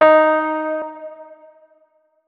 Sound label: notes, keyboard, electric piano